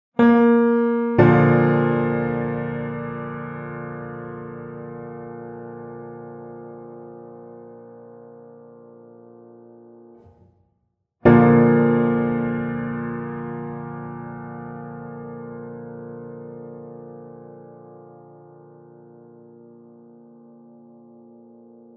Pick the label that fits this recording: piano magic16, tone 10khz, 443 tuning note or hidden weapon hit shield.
443 tuning note